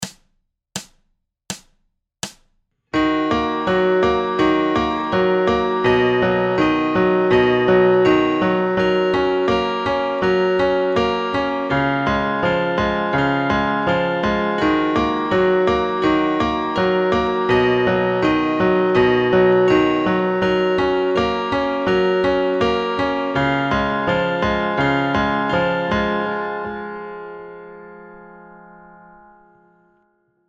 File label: minus guitar full